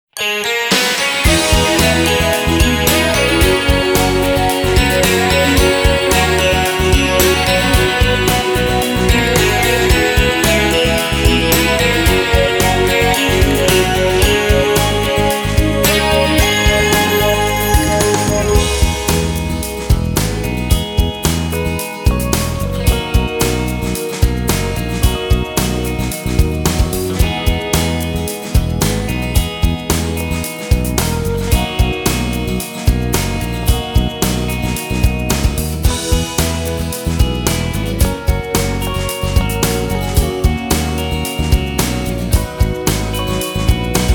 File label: Off vocal